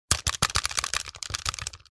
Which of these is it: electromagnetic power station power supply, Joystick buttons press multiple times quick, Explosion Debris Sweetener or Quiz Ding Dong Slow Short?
Joystick buttons press multiple times quick